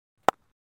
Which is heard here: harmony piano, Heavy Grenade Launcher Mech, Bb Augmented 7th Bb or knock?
knock